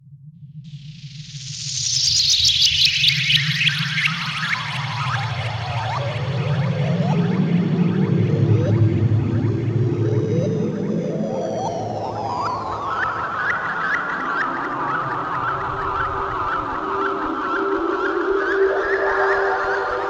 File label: Teleport Synth